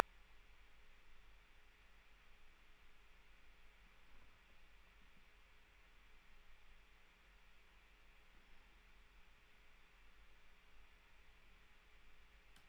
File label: Noise